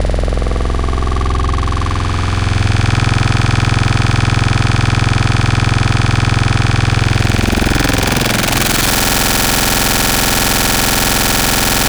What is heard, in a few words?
growl; ESW Growl - Mission